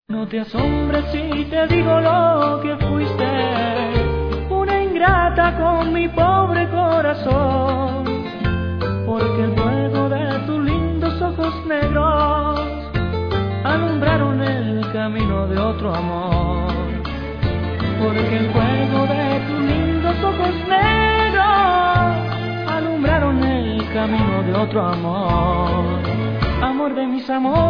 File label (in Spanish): vals, Es uno de los pocos ritmos que tiene 3 tiempos en vez de 4